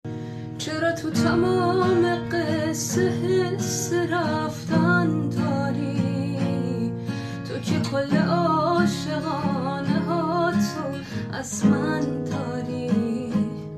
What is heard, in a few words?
Guitar